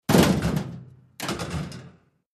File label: Junk Slams Metallic